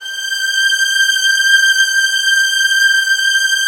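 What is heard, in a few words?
violin; Virtuoso Strings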